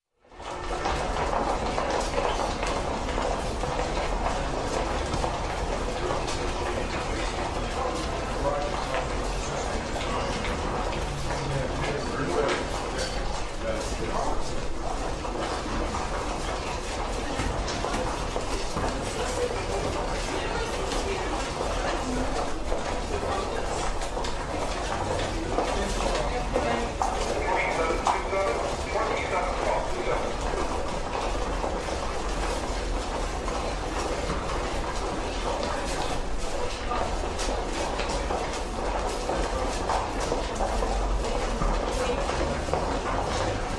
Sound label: Walking crowd